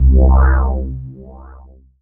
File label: Metallic - C2, Synths; Multisamples; Metallic Love; Ableton Live 12 Lite; Core Library